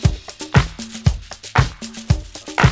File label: SHAKI; AMG - Now CD-ROM (Roland)